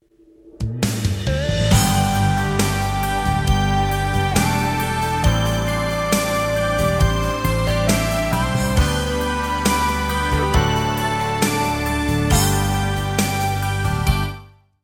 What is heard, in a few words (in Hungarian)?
Orakozi alapzene; SZEKELYUDVARHELY